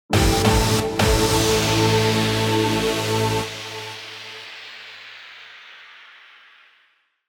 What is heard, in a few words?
news hit